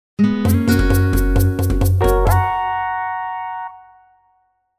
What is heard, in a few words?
flourish